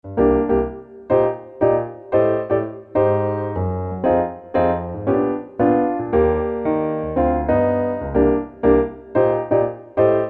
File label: solo piano